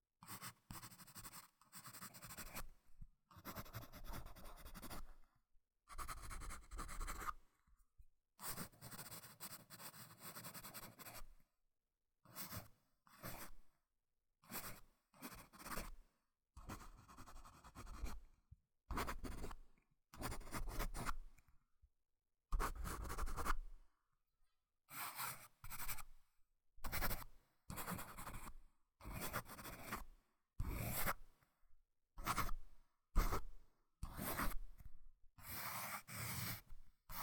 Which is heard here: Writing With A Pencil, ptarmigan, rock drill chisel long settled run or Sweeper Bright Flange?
Writing With A Pencil